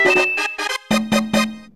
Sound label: Sound Bomber - Win; Mario Artist; Polygon Studio; N64